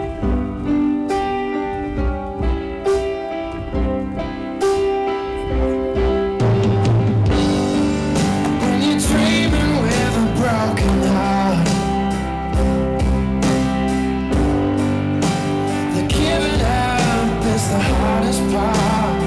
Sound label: distortion, AT897 channel